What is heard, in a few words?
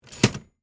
Typewriter Return